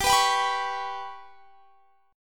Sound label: G Major Flat 5th chord; GMb5; strummed; x,22,21,24,24,21